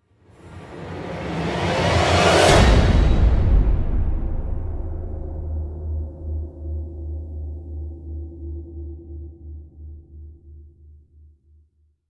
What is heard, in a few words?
Rise